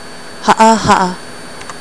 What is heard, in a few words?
haahaa